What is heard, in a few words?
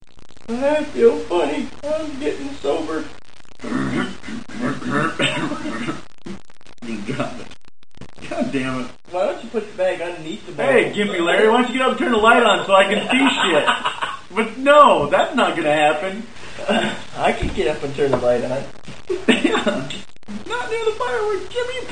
firework